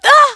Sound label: pain